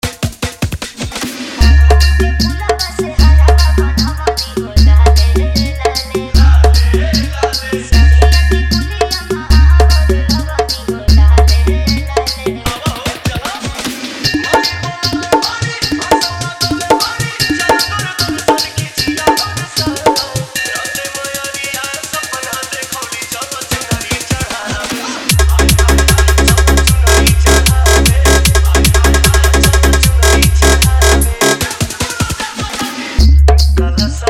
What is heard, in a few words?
Navratri Dj Song Edm Drop Mix